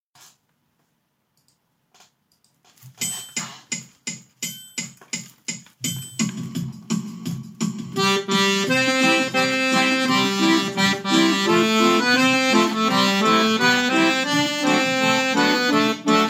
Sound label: caisons rolling with drum; Student Music